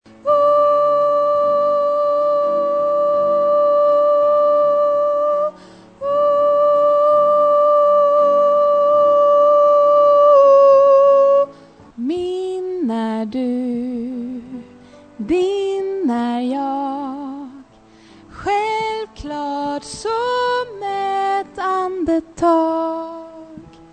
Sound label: tenor